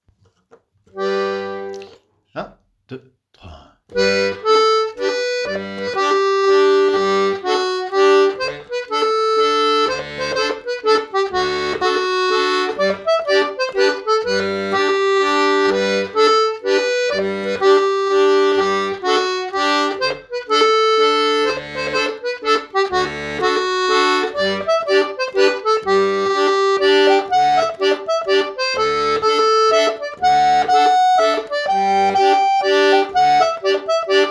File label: Mazurka d'Aynac (G)